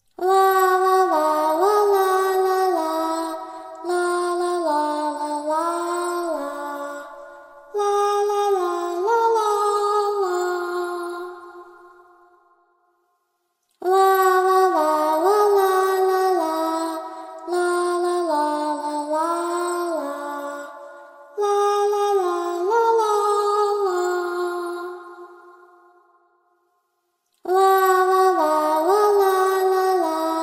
creepy little girl singing la la la scary horror voice